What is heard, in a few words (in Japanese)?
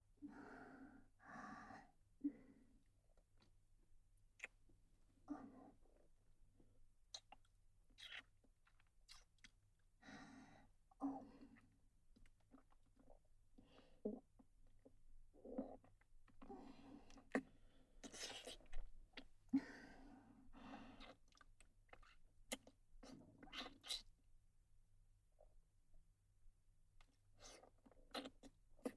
事務的ビジネスフェラループトラック, ASMR; クールな奴隷エルフ姫とのビジネスエッチ→ラブ堕ち性活2～二人きりの新婚旅行であまあま天国♪